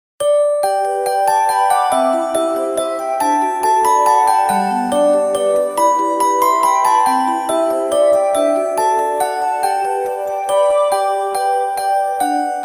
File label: We Wish You a Merry Christmas, cisco ring